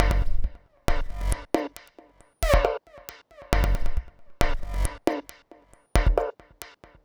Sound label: Mod Loop, Modular Percussion